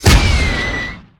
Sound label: Rocket Launcher Shot; Weapons